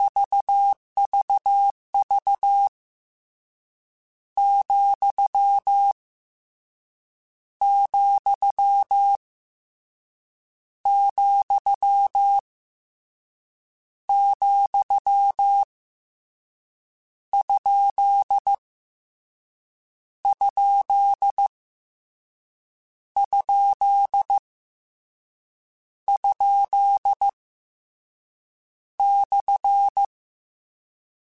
Morse Code, CW Code Groups; Mixed

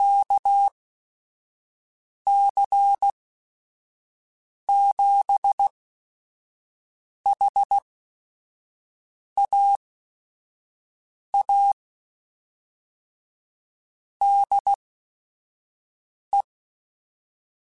Morse Code, QSOs

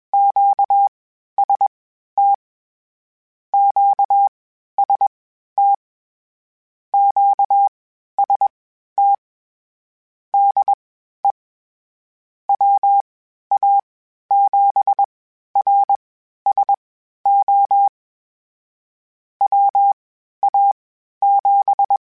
QST QST, Morse Code; Mixed; HamRadio

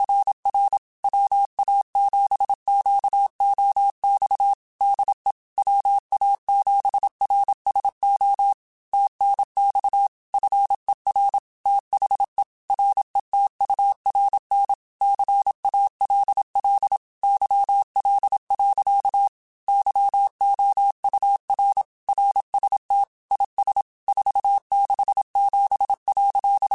Morse Code, QSOs

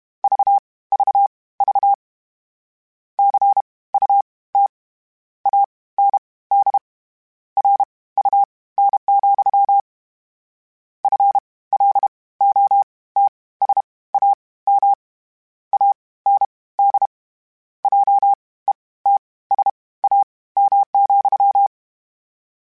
Word Pairs, Morse Code; HamRadio

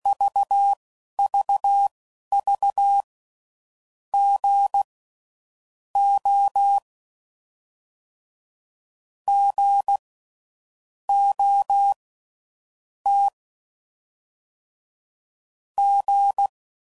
CW Code Groups 10WPM; HamRadio; Morse Code; Mixed; Short Runs

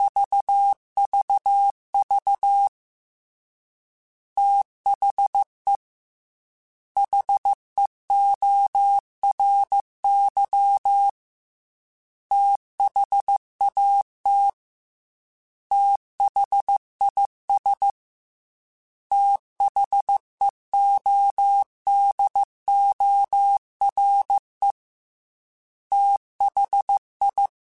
TH Runs, Morse Code; CW Code Groups; Mixed; THs; HamRadio; Prefixes

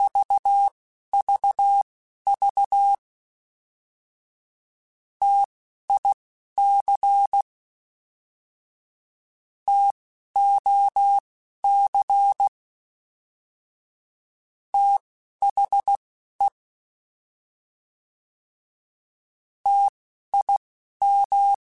T Runs, Morse Code; HamRadio; Prefixes

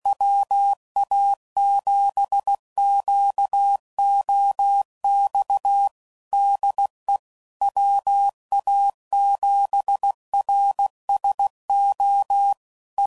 CW Code Groups 15WPM, QSOs; HamRadio; Morse Code; WA7QOX

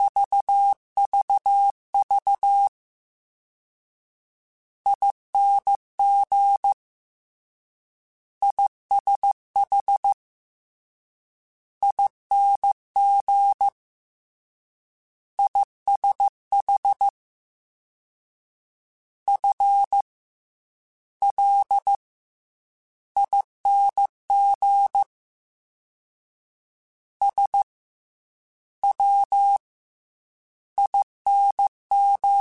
Morse Code, CW Code Groups; Mixed

